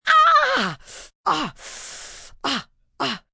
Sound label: Pain Stubbed Toe, Pain, agony, female, mild pain, annoyed